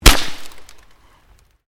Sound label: Glass pane puncture; Material components for constructing more complex sounds